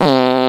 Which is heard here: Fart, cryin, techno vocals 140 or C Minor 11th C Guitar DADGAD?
Fart